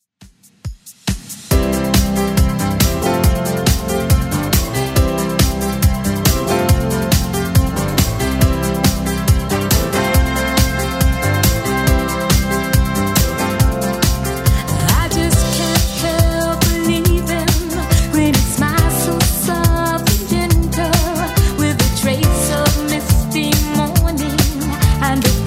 70s Redrum 139bpm

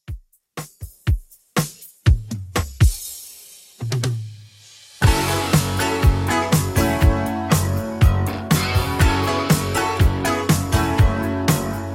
80s Redrum 121bpm

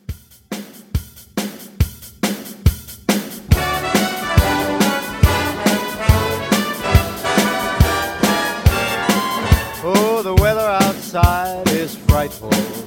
50s Christmas 140

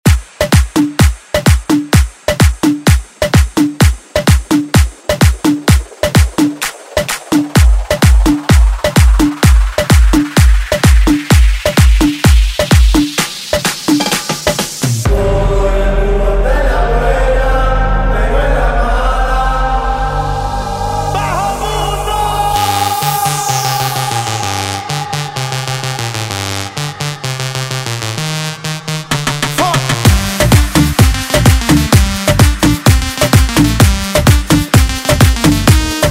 Latin Dance

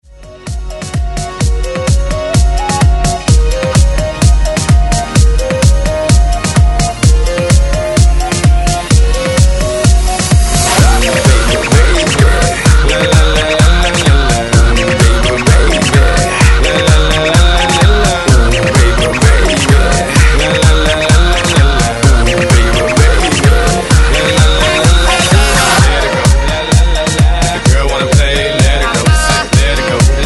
Scratch Re Drum 128 Bpm; DJDOLLS

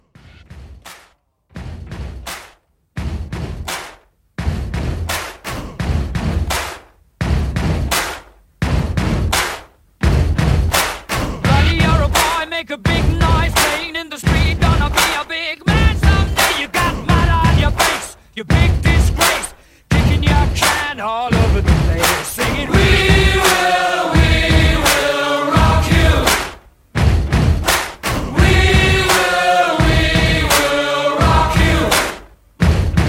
70s Rock Re Drum 85

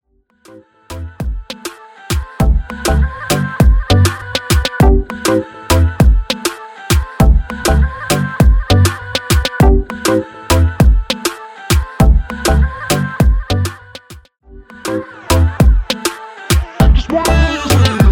Afrobeat Remix 100bpm

